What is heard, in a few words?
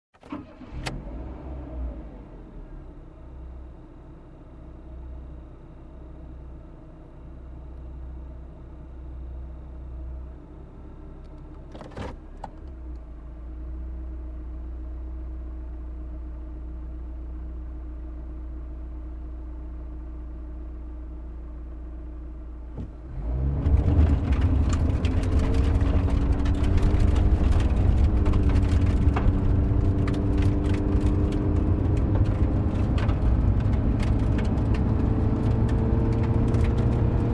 Car ride on dirt road